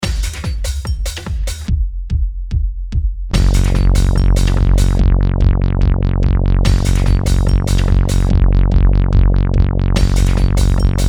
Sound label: Zoom G3 Synth, bass